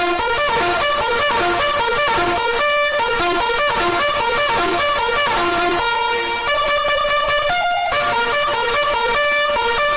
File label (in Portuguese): sirene alarme bom para acordar